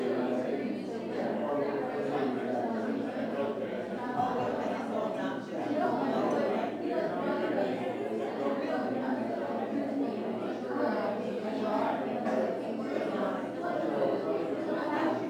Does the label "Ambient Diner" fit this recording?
Yes